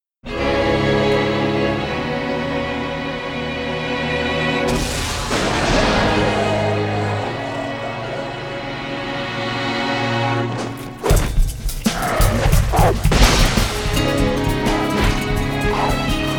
(Percussion Ver.)

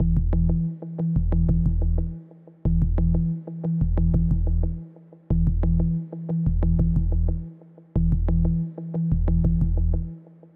pluck and bass; one dog story

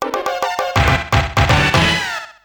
jingle ~ starting race

